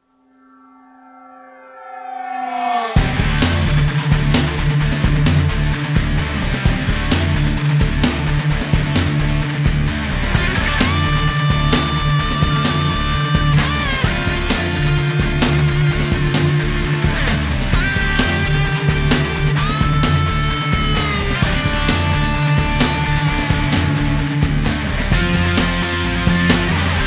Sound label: sports rock